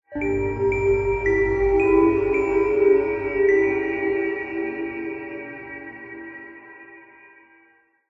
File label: horror ghostly musical riff nursery rhyme